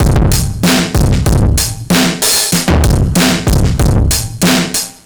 Slack Drums B, 95bpm, Drums n Perc, analogue circuit; musicradar